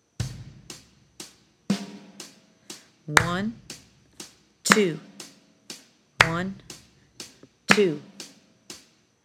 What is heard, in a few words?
8th Notes Compound Time; Traditional